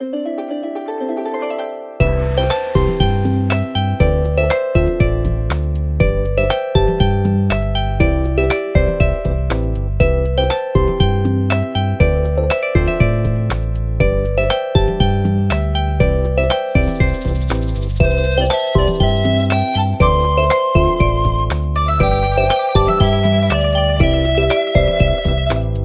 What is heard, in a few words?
music